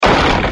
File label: Wood Hit Large; Monster Truck Destruction; Android